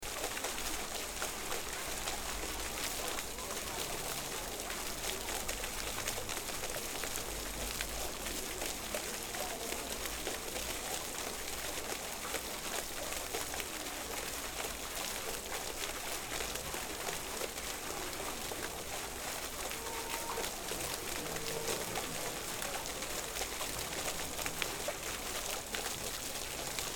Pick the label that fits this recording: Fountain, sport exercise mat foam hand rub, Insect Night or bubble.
Fountain